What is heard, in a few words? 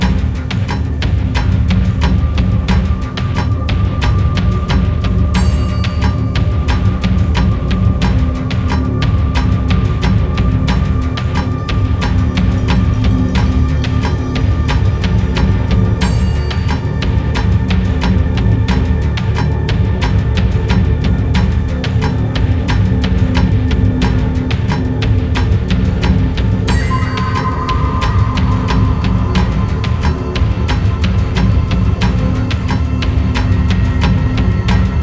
battle high tension; Son of Nor